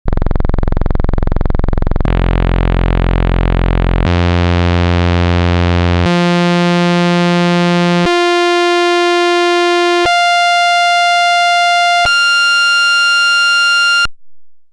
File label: Dreadbox Polyend Medusa Digitale Oszillatoren